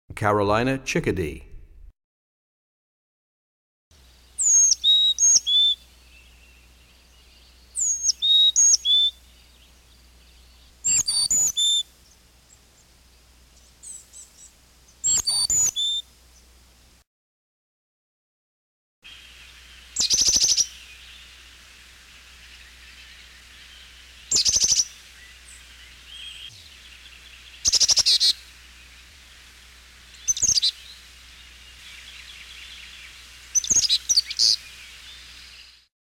Carolina Chickadee, Bird Songs; 101 Bird Songs

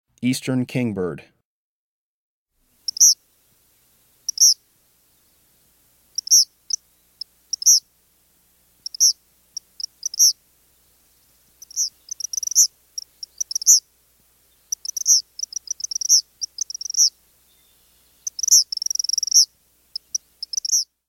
Eastern Kingbird

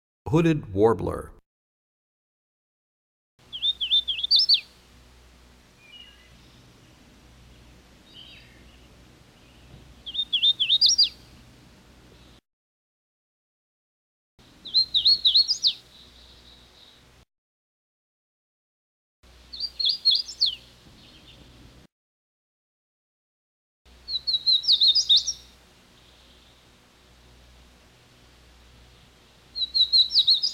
Hooded Warbler, bird songs; 101BirdSongs

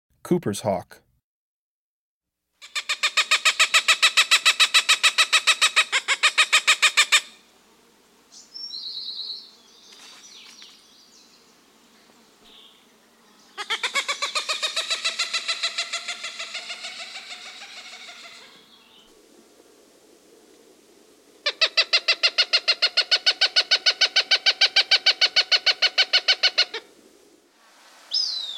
Cooper's Hawk, Birds of the Chicago Region

